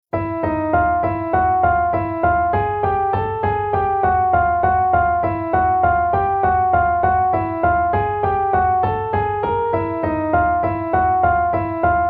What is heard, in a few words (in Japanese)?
不気味系 Earie, ホラー系BGM, 怪談 都市伝説 ダーク 不気味 不思議 緊張